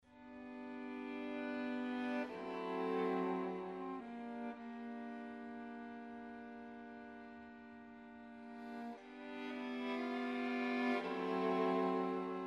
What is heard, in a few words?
trio; juon